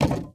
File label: ui drop wood med; Rust